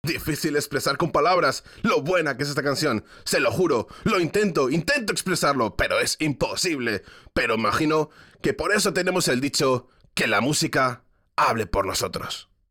Music Vehicle Radio Events; Jurassic World Evolution

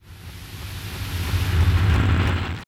Combat Drum

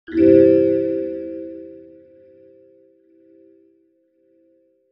discovered Item

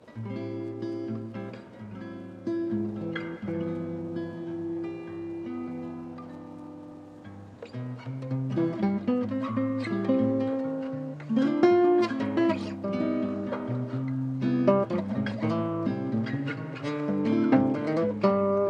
Radio Jazz; ether one redux gamerip 2015

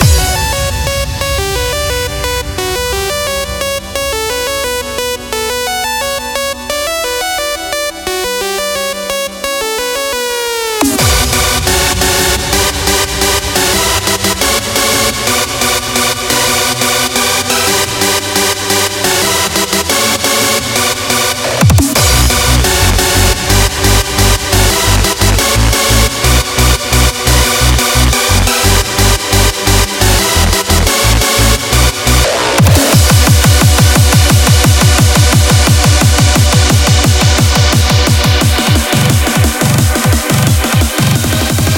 Freaky Happy Hardcore; hiberworld android ios online windows gamerip 2019

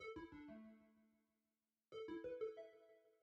Loading (Jingle)

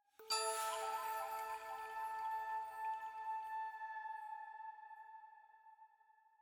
item Jingle Flute Chime A

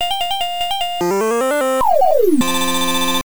Game Over Music; Pac-Land; TurboGrafx-16

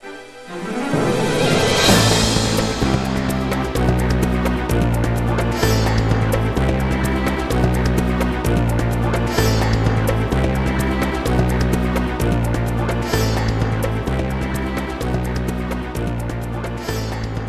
battle boss; Yo-Kai Watch 3; 3DS

